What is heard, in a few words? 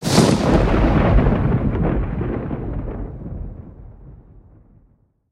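thunder roll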